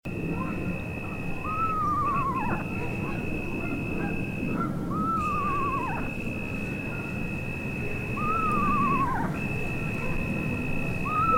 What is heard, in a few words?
eastern screech owl call; chesapeakebay